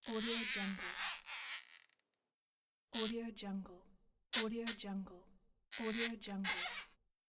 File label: clamp